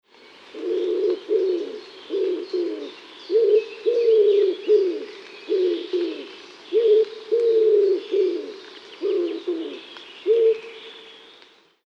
Columba palumbus

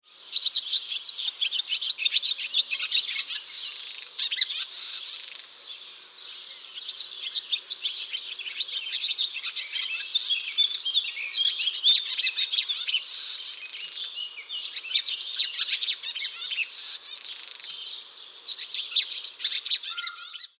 Hirundo rustica

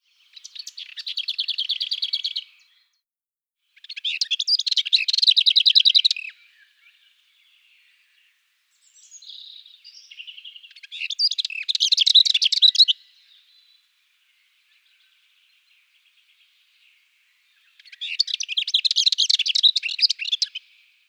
Sylvia curruca

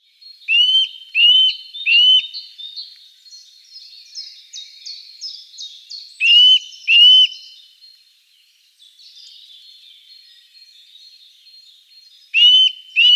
Sitta europaea

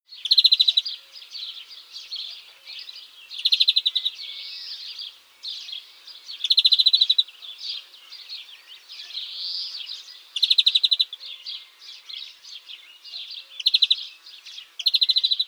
Carduelis chloris

